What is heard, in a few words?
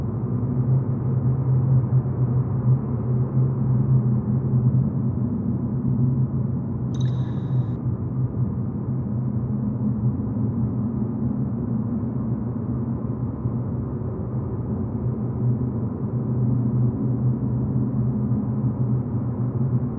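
temple loop, ambient soundscapes; dota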